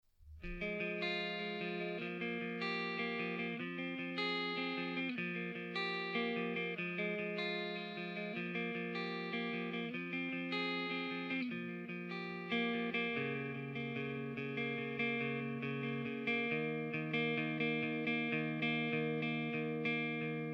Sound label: guitar